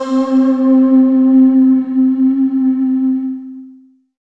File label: SYN, Roland, digital